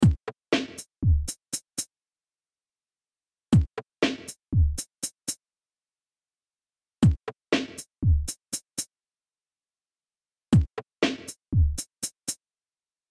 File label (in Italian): 7 4 Improvvisazione